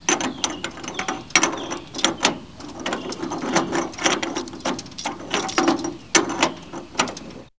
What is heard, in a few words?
valve; Hellboy